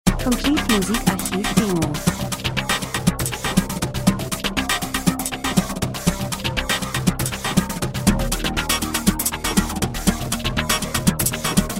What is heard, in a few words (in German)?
drums, rhythmus; Percussion Elektro Spannung düster Gleichförmig Spirale Kalt Urban Autofahrt im Regen Industrie Maschine Dokumentation